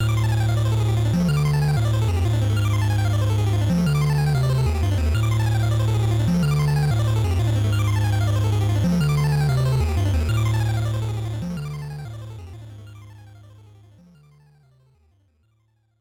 Double Ball Port Open; Pin Bot; NES

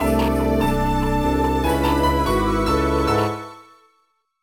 BGM STAGE BATTLE; Phantasy Star Zero

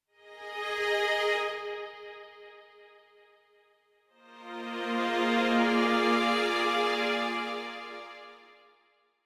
bgm exit cafe; Meitantei Pikachu Shin Konbi Tanjo

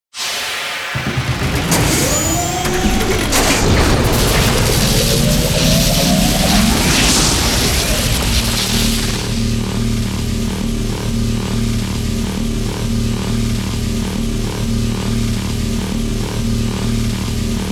Ambience Factory Gears; donkey kong country returns 3ds wii wii u 2010

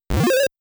Monster Blow; Dig Dug

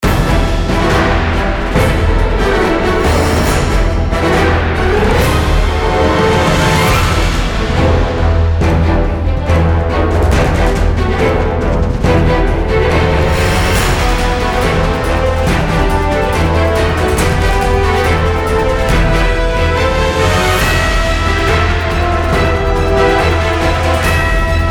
Bgm Cave Dungeon

